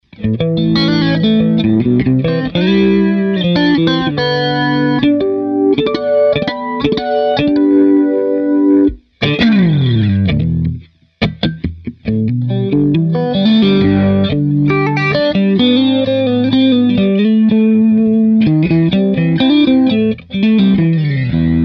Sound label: Sp Chorus